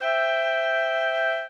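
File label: Maj Cl Fl Ob, major clarinet flute oboe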